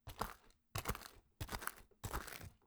Footstep Fullstep Rockslab Stones Walking Plimsole Light